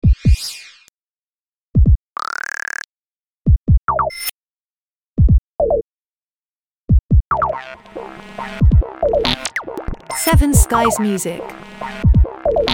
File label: Robot electro robotic